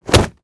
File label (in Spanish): GOLPE CUERPO